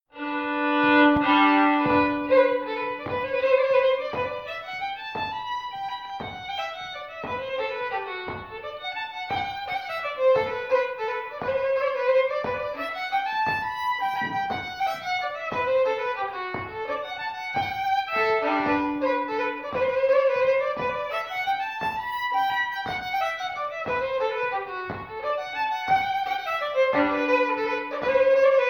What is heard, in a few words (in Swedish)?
Vals i G-dur; efter Lorentz Brolin